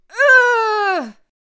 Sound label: death vocals